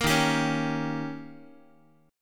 D sharp 7th D sharp, Guitar 4A,4E,5A,5D,5G sharp,6C sharp; D#7; strummed